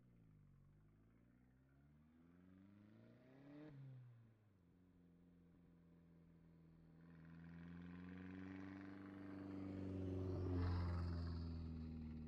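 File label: Toyota Endurance Race Car exterior circling, Drive in Circles By, Distant; Toyota Endurance Race Car with Audi Engine; ORTF; MKH8040; Pole Position Production; GDC 2023 - Game Audio Bundle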